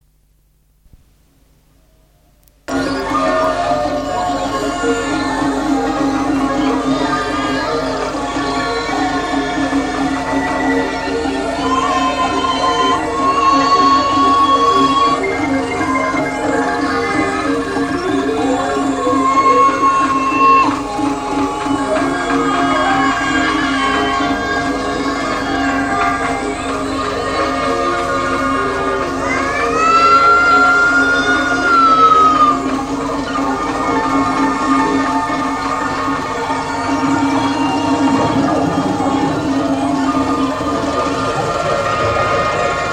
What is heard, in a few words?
white noise